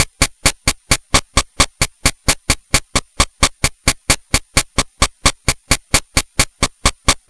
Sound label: Game Boy Noise Hat Loop, 8-Bit, Drums